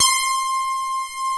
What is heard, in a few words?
RAVE; Pro-Rec - Synthsonic